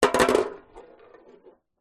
ball bearing set down on thin hollow metal; industrial; zapsplat

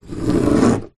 foley wood scratch with metal object; zapsplat

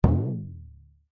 cartoon impact drum ascending; zapsplat

